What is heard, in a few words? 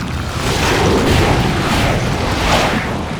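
carpet bomb effect; rumble skin03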